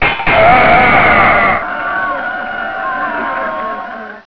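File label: Weapon Crowd; Sir Richard The Lion Hearted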